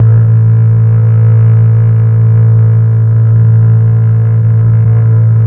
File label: STR, Melo. Cello, Slow Cello; Roland LCDP09 Keys of the 60s and 70s 1